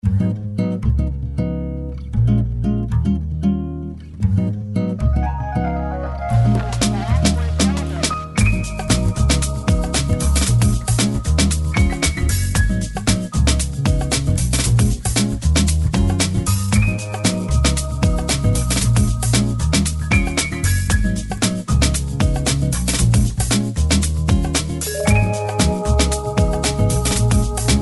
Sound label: jazz